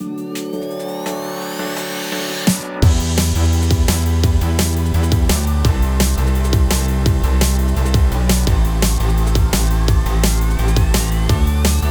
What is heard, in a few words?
jingle backing track